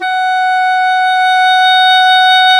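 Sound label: WND, English Horn, Swell; Roland L-CDX-03 Disk 1; Eng Horn Swl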